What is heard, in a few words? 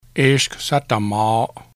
screech laugh